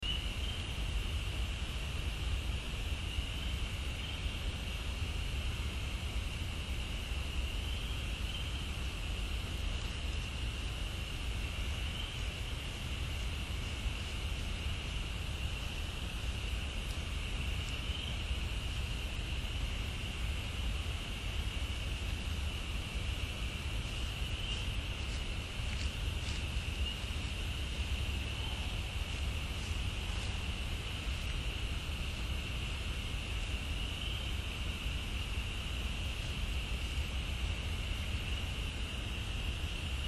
walking in camp; Another visitor in camp 01:32hrs; Louisiana

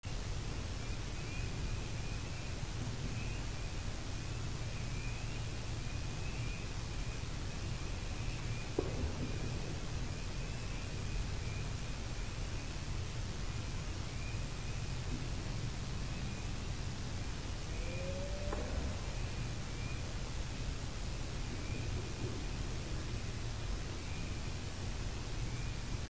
wood knocks; 2 Knocks at 4:29AM February 10th

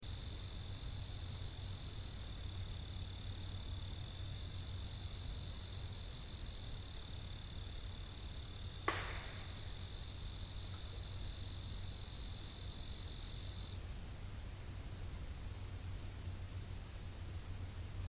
wood knock

